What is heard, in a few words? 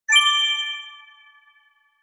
Song